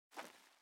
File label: jump generic